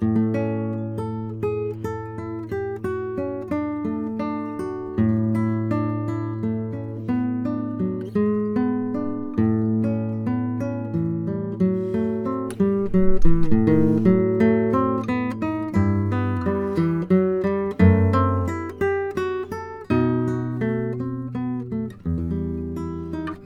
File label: gitara, Neumann KM184